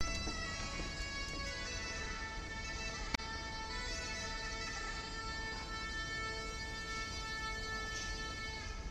bagpipes

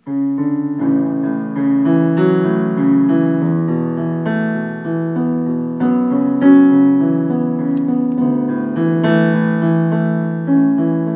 piano keyboard

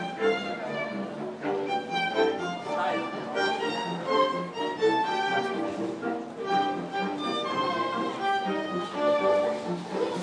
live string quartet; john lewis's

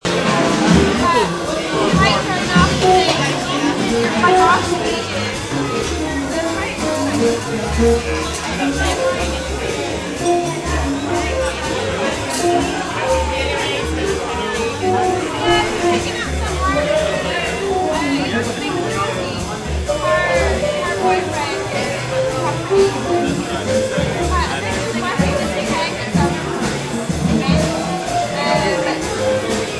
mono jazz; jude on break

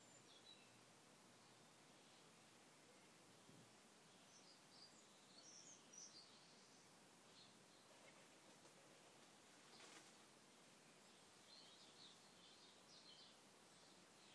garden sounds

